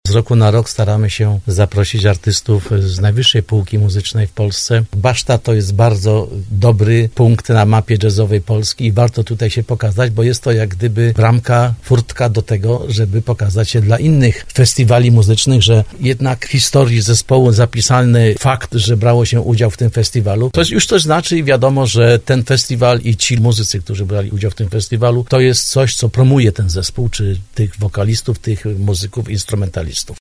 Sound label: jazz